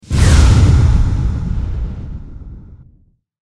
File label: explosion; cstrike; war3source